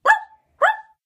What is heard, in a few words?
yapping; Voice